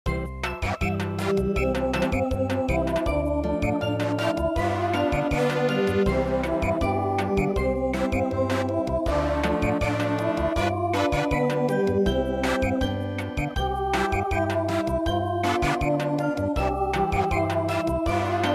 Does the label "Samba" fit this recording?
Yes